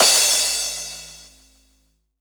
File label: Crash; Electro Flux Sound Kit; ED Crash